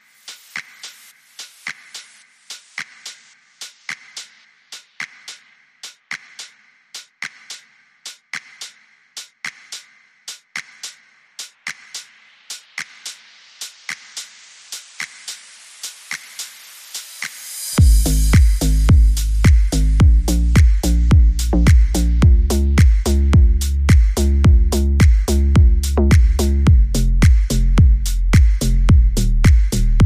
Drums Bass and SFX Only

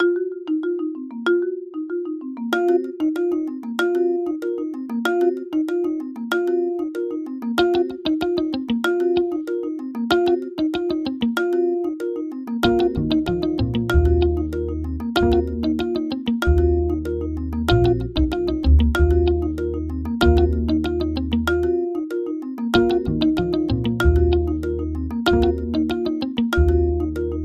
Tortoise Guitar

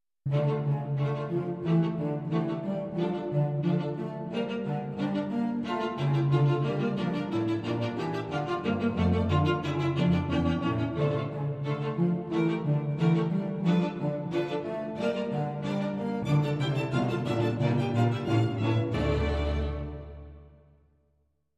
Strings Only

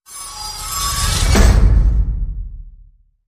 Fingernail Scratches Slam

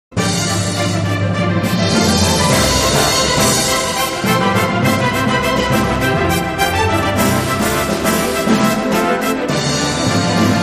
World Fanfare

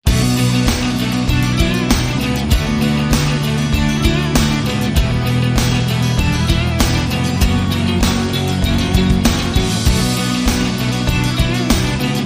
Ukulele

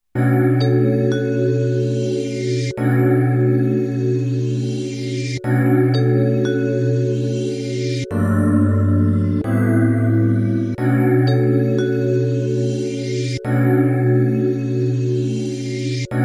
Bluesy Hip Hop

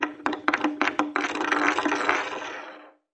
Dreidel Spin Drop

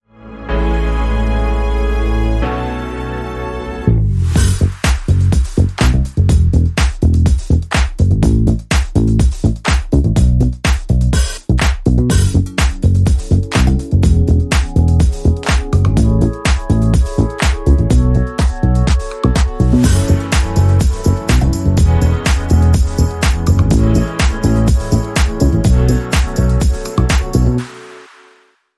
Electric Disco, Rhythm; 30sec

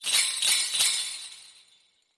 Sleigh Bells; Santa

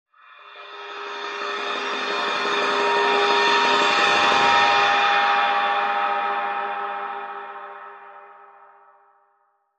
Tranquil Glass Splash Roll

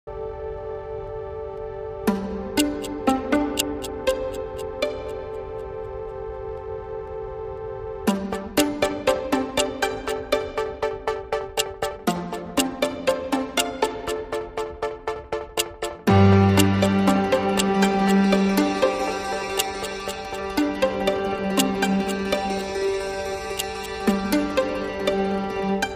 Modern Art Underscore

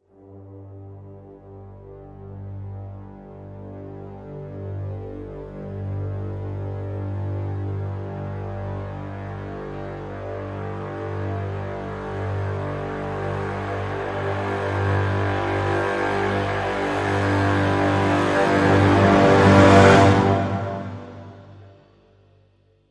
Brass Swell Lo (G); Cres

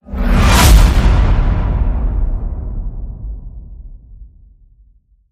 Full Hit Mode

